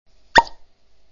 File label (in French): texture debouchage